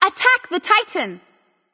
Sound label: vox attack light; smite; hel